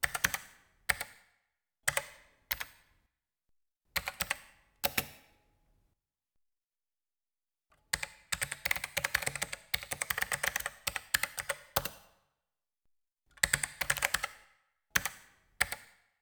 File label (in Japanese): SE キーボード